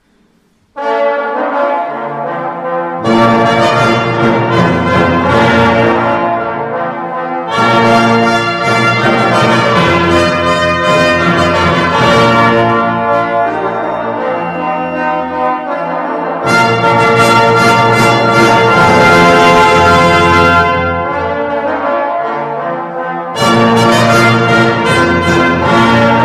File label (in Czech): adventni koncert hudba